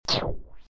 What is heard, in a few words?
Stomp; Enough Plumbers 2